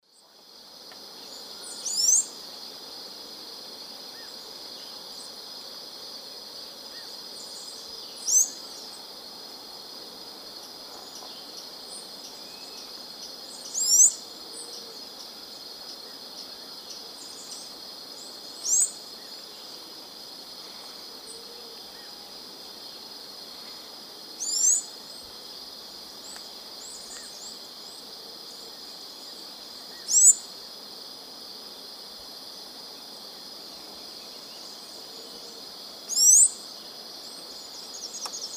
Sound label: Thraupis palmarum, calls; S. America; Eastern Lowlands